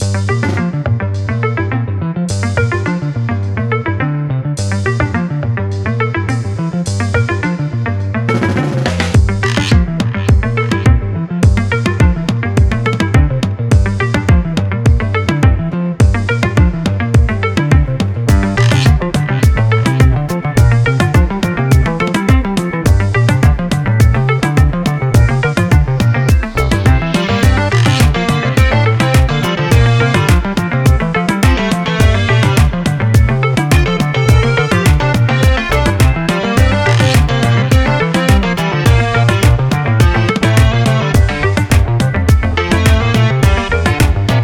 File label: music fun loop; Necrobarista Final Pour